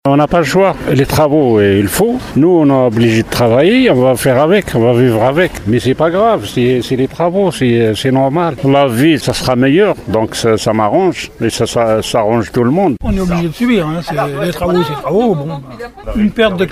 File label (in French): chantier forains